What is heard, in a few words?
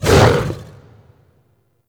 Axe Weapon Swing; Wolf Frame